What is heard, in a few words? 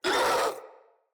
Infested Charger Pain